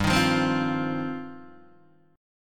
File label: G 7th Suspended 4th Sharp 5th, Guitar DADGAD